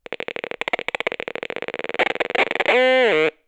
Cracklebox Clicking

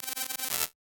Beep Oops

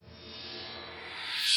Sfx Short Reverse Sfx

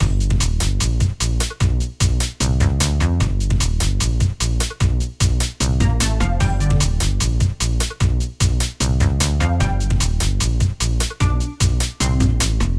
Bass Line Theme Loop

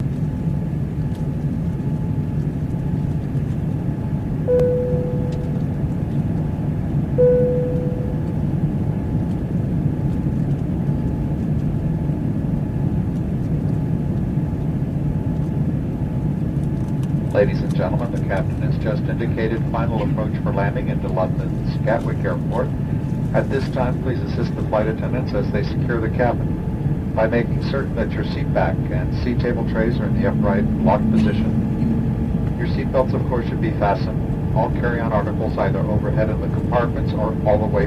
Gatwick approach

